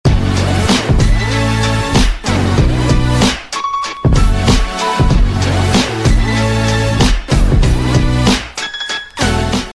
sms alright ringtone

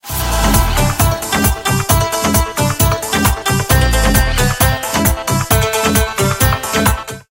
bing bing ringtone

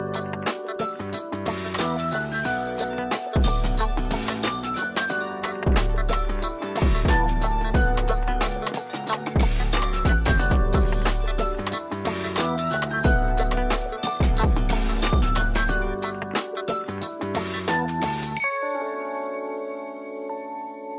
cool nokia sad music ringtone

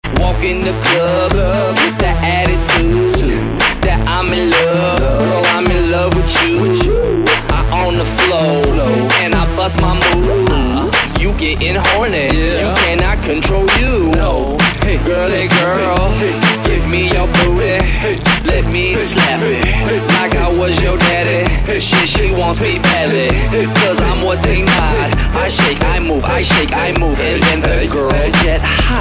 rap song tone ringtone

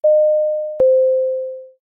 store door chime, ringtone

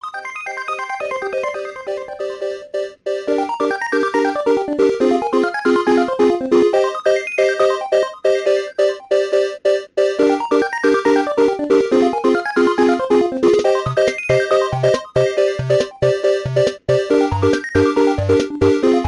dance call tone ringtone

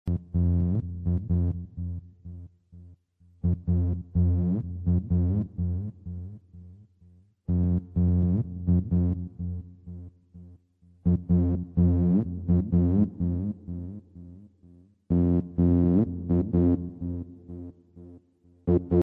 bass

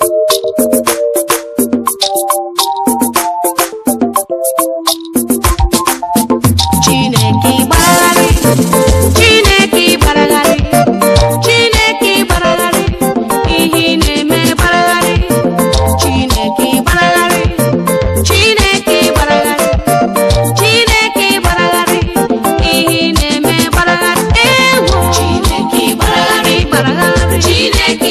christian tamil ringtone, christian gospel

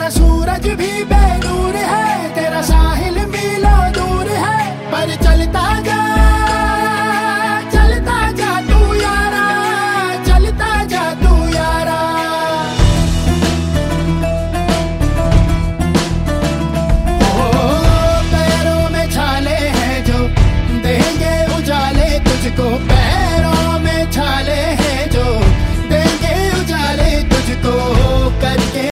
ringtone bollywood ringtones

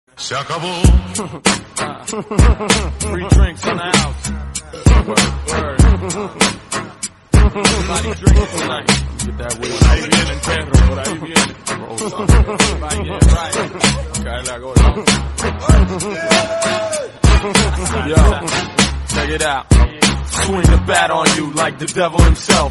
english song ringtone

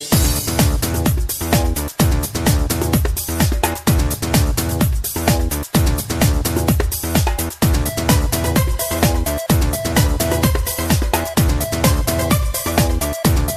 speedy drive English fast music ringtone

